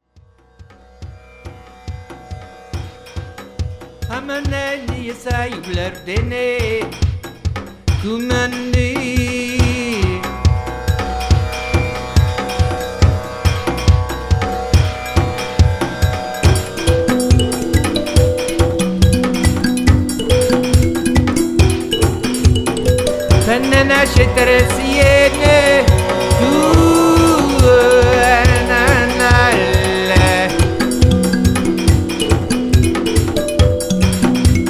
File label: mbira drive